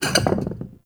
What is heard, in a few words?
Vase Medium Slide; Objects; Vases